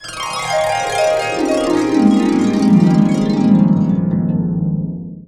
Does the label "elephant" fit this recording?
No